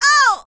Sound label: pain; calliope